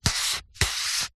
food drink coffee cup disposable plastic lid turn slide on cup